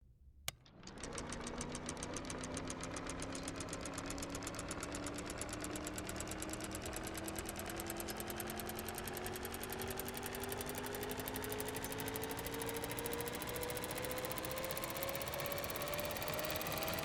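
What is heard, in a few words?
film projector reel spinning with acceleration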